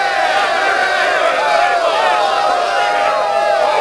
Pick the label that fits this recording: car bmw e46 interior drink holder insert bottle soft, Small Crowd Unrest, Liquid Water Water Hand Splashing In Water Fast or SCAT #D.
Small Crowd Unrest